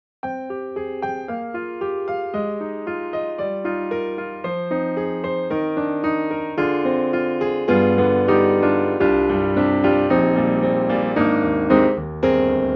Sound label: Keys Studio Grand Retro Grand; XLN Audio; Addictive Keys